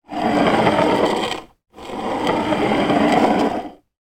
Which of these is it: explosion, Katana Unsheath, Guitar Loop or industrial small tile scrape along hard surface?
industrial small tile scrape along hard surface